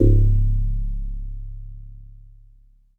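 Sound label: Jazz Harp C#4; Kawaii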